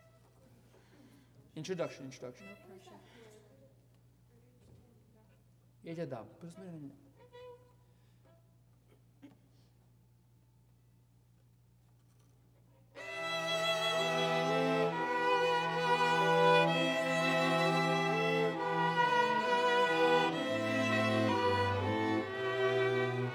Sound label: Overhead